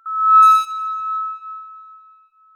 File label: Mall Stage Feedback; Hub; Warframe; Ambience; Nineteen Ninety Nine